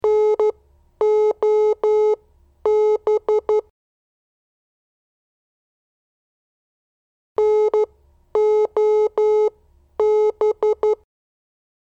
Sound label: Morse Code